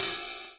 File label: Ride; Equipped Music; Loungin House